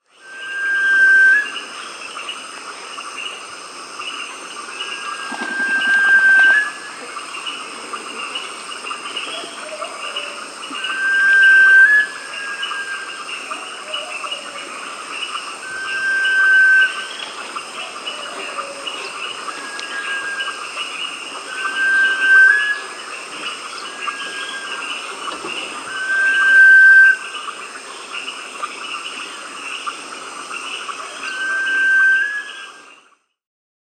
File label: Sunbittern (Eurypyga helias), Peru; S. America